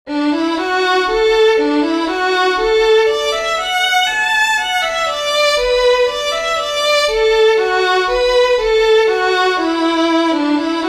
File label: WALTZ, fiddlers